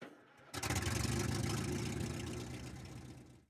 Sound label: start engine, engines; World of Tanks